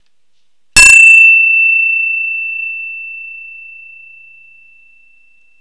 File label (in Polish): DZWON